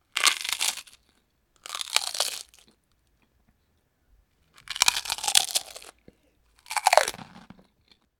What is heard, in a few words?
Eating potato chips mouth open crunchy